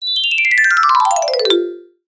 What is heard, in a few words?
cartoon descend fall mallet